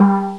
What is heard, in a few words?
Steel Dr. (G2); X-Wing; X-Wing Patches